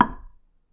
knock on thick glass; knocking

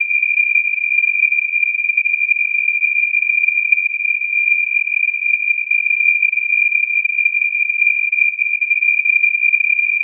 2400hz noise; horror

